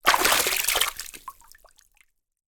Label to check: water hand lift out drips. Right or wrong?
Right